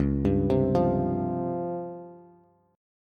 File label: Db Major 7th Flat 5th, Bass; DbM7b5 arpeggiated; JGuitar